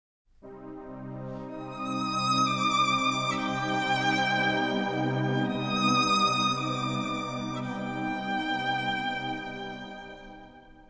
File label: Sorrow (Game Over); Elemental Gearbolt